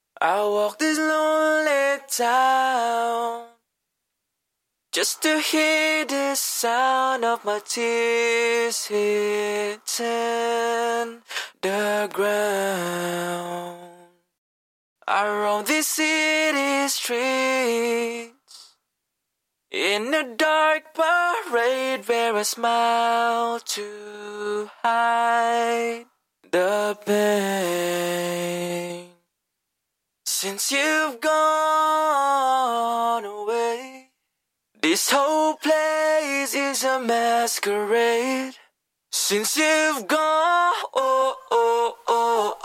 pop 107 BbM, acapella

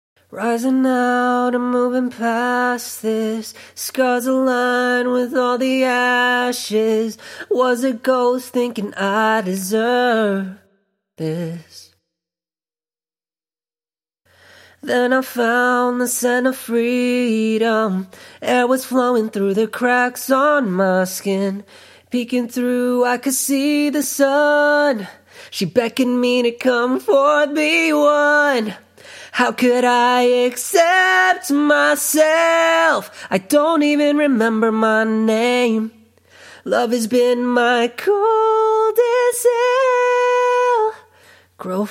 pop 132 Em, acapella

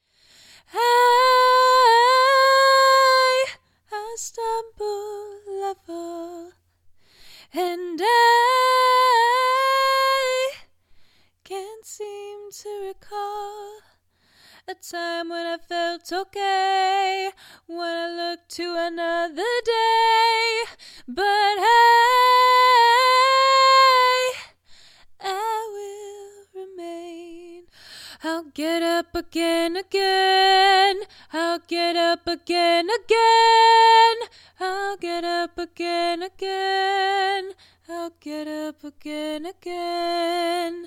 acapella, Pop 150 Fm

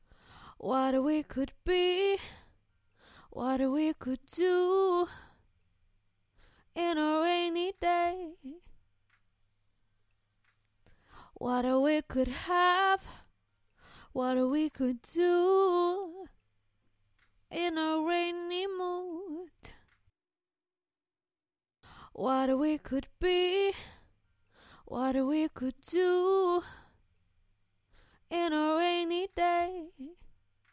acapella, pop 89 Bbm

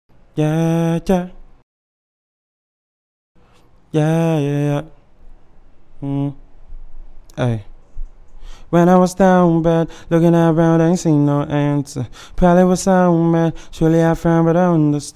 pop 130 Bm, acapella

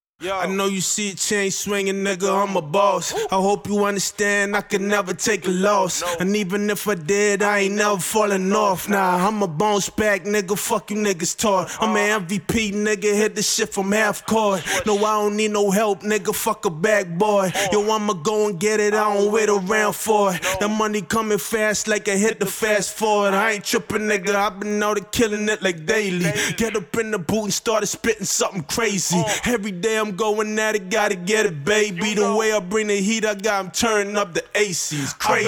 acapella, HH 166 Am

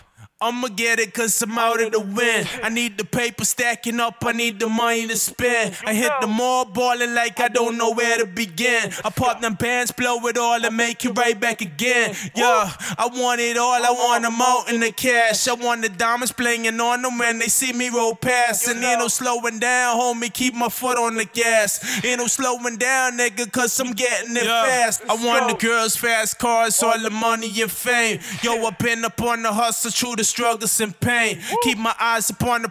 acapella, HH 150 Bbm

